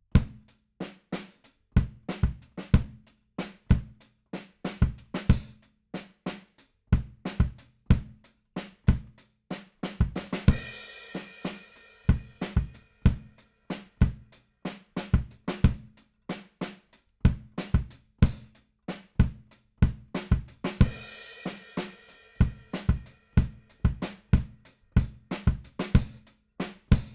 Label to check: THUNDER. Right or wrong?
Wrong